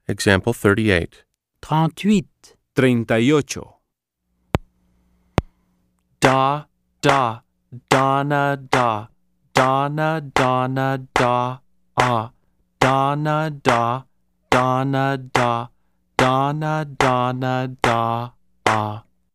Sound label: Keyboard